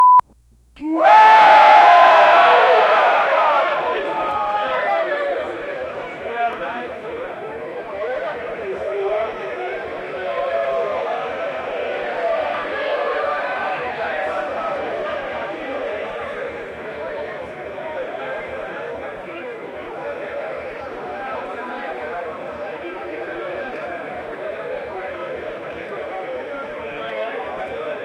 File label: tawern crowd speakig and